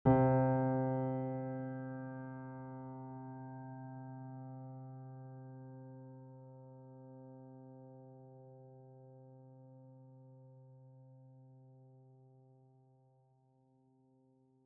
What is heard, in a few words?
piano not forever